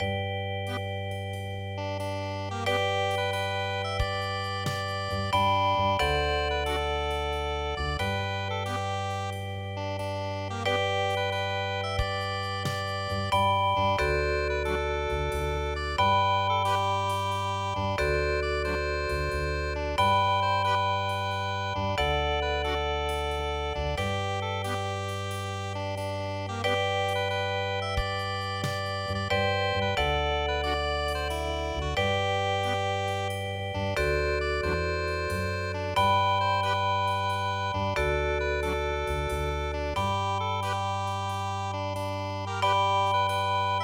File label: Paris Musette, midi; Normandy